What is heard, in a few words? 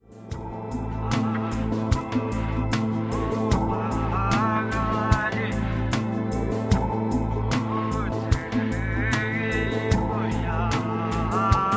Dark Drum